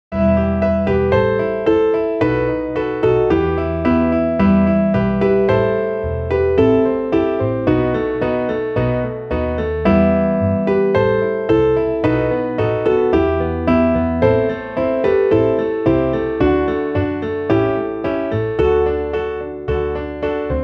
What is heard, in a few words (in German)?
midi, klavier; zum abschied